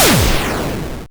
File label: explosion medium